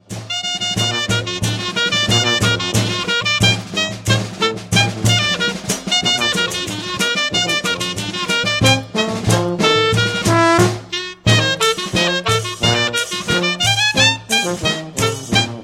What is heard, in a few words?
Polka